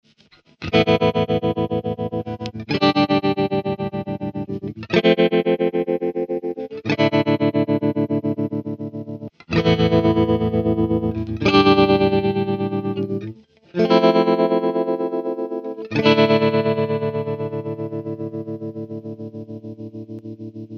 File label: Opto tremolo